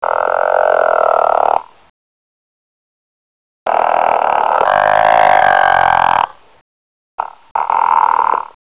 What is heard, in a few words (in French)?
grenouille; de enfer2k